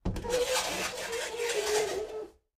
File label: Fingernails Scrape, chalkboard